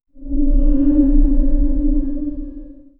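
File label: futuristic sci-fi ambience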